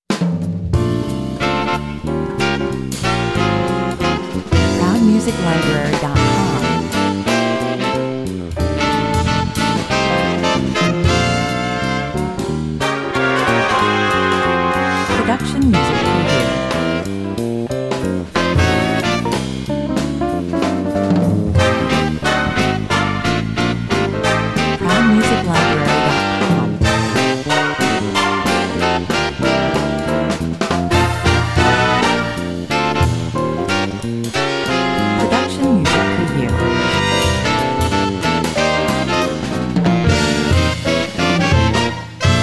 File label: Upbeat Big Band tune featuring saxophones, trumpets and rhythm section, 102 bpm; swinging with the band